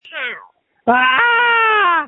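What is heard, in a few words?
Scream, phone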